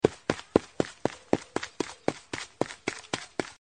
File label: Run